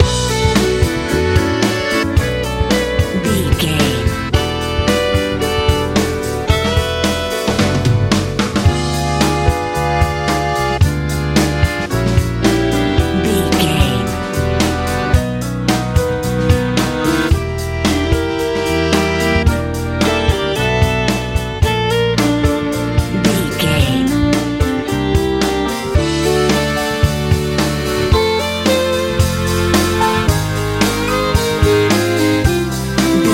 easy pop

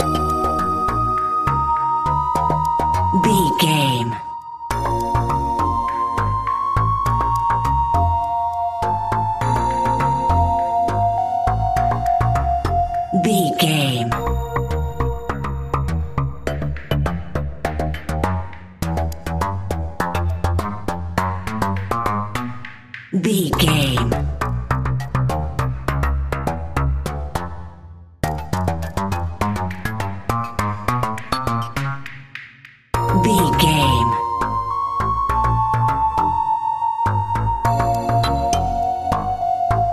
mysterious task video game

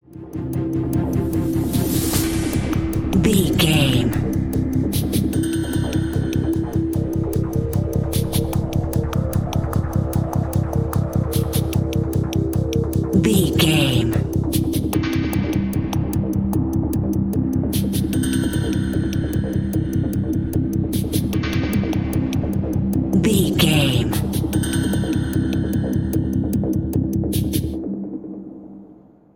suspenseful ticking

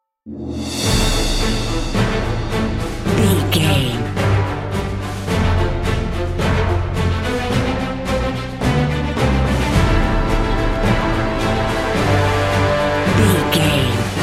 march patriots epic cinematic bed

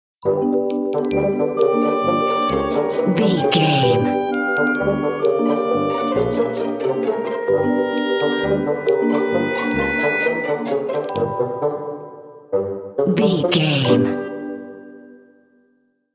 suspicious sneaking bumper

